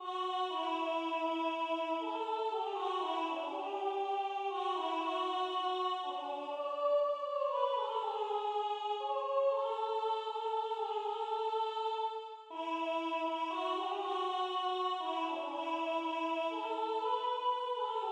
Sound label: Sopran; La Nuit